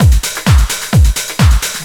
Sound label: techno, DRUMLOOPS